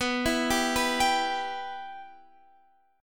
B Suspended 4th Sharp 5th B Guitar Drop D

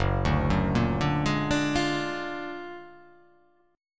F sharp Augmented Major 9th F sharp

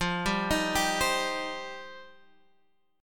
F 7th Suspended 2nd, Guitar Open G

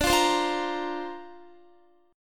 D-Minor 7th Sharp 5th D Guitar, chord; Dm7#5; strummed

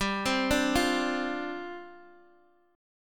G 7th Suspended 4th G down Guitar Open D

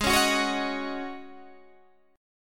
G sharp 7th Suspended 4th G sharp, Guitar DADGAD

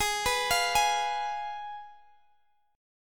Ab Minor 6th Ab, Guitar Open G

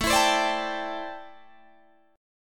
A Minor 11th A Guitar Open G

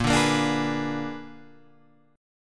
A sharp 7th Flat 9th A sharp down Guitar Open G

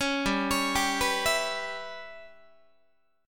G 6th Flat 5th G, Guitar; G6b5 arpeggiated

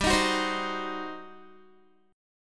G Major 7th Suspended 4th Sharp 5th, Guitar; GM7sus4#5; strummed; 15,18,16,17,15,x

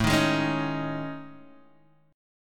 A Major 7th Flat 5th, Guitar, Open G; chord

